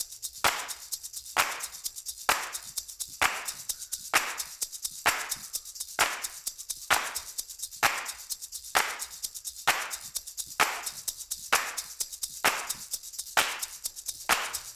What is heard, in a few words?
Percussion